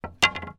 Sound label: pool snooker cue down on table; sport